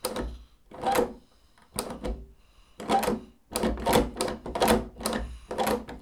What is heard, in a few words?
Struggling With Door Opening